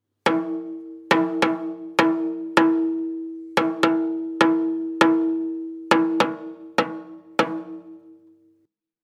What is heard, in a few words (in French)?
impro tambourin; loto sonore